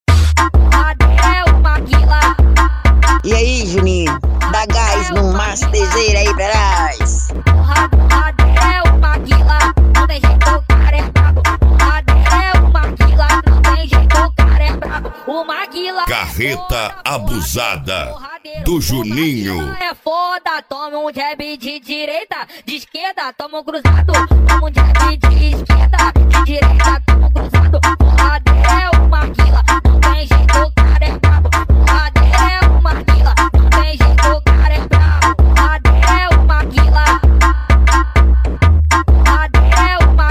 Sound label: mega bass